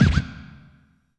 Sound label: Low Scratch